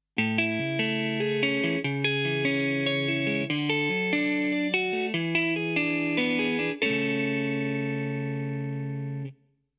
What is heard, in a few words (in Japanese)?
ダブラー Gt なし